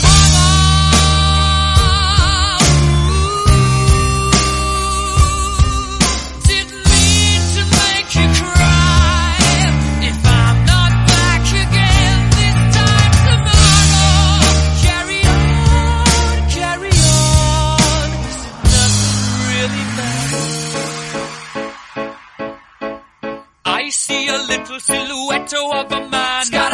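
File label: REDRUM 141bpm E flat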